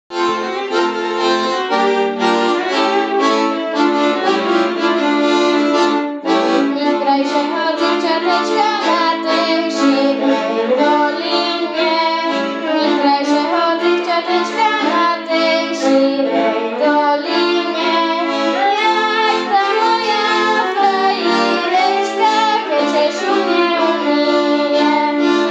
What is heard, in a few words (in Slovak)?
so spevom; nit krajseho dzifcatecka